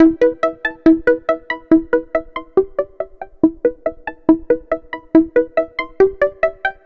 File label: Synth